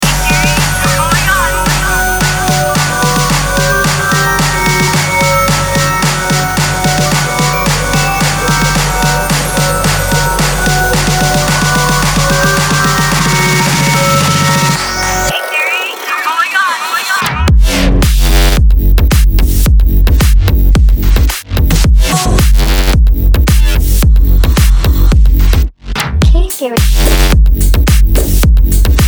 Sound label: glitch; Unison Artist Series; K Theory; GLITCH-D OUT Volume 1